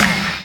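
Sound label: percussion FX, Dance Drums; Best Service; Dance Mega Drums CD2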